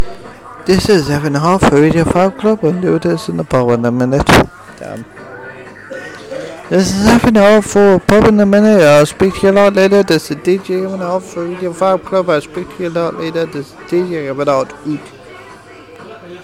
pub

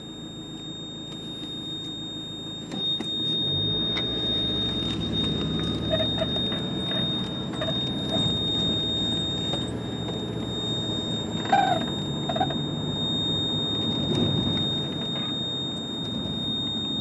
this alarm on adapted mobility equipment in car

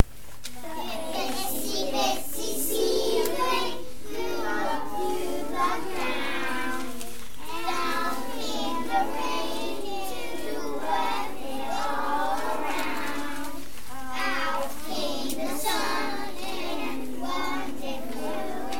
itsy bitsy seedling song

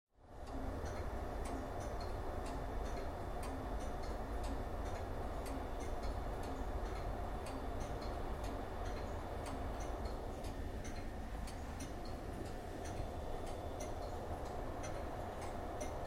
ambience at the clockmaker's museum

